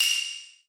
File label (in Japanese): hyoushigi